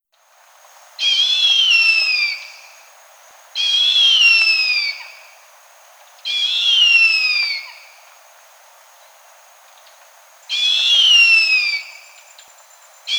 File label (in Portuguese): Canto da Harpia